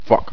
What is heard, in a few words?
geiger